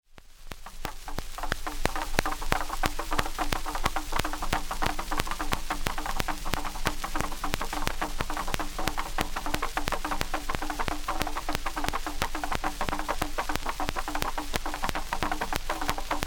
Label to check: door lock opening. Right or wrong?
Wrong